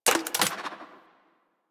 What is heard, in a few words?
Spear Mech, Weapons; Warframe; Tenno; Priest Spear